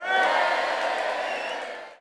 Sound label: snowman crowd; MiniGames